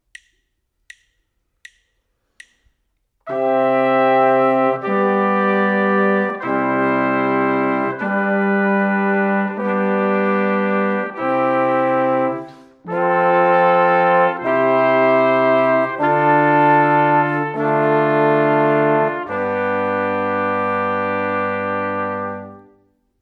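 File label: harmonic dictation, brass quartet; ear training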